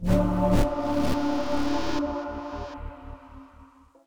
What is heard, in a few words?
Sting